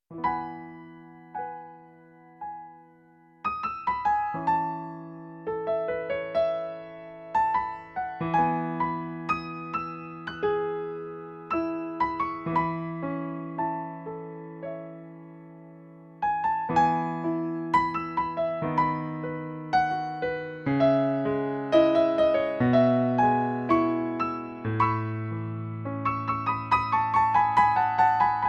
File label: Piano